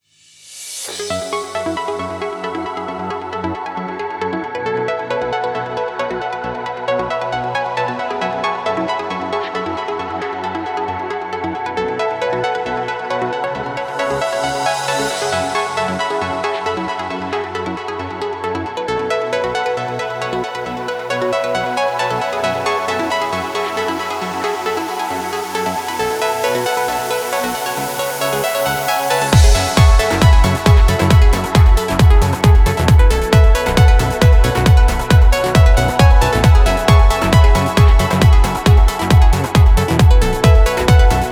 135BPM Complete Mix, F#minor; Vengeance Samples; Restart Kit

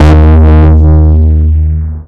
Root D#, Bass

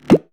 Percussion; Vengeance Samples

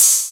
Cymbals HH Open; Vengeance Samples

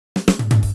Fill Ins 128BPM; Vengeance Samples; VEH2 Loops

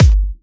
Hard Kicks; Vengeance Samples

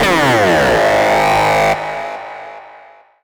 Synths G#; Vengeance Samples

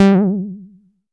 Synth Bass; Vengeance Samples; VEE Synth Shots

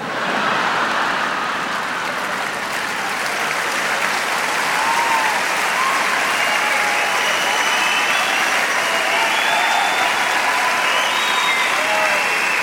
Crowds; Vengeance Samples; VEC2 FX

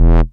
Bass Multi Rev E; Vengeance Samples

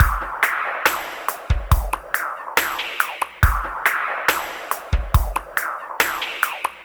Slomo Beat 140 BPM, Loops, Slow Motion Beats

